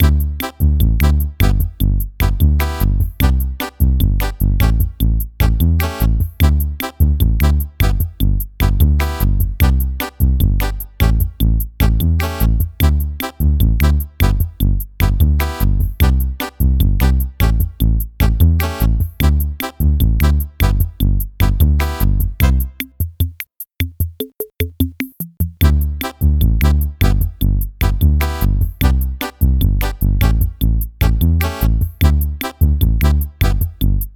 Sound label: Background; Bomb Corp.; Jackbox Games; The Jackbox Party Pack 2